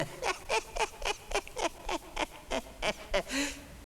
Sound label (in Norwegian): hemulen ler; mummitrollet